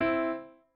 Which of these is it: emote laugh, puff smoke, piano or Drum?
piano